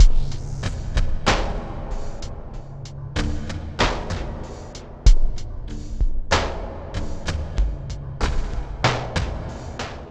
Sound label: Terrestrial Hybrid, Processed Percussion